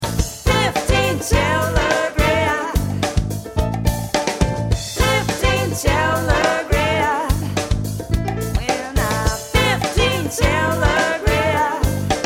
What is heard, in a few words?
telegraph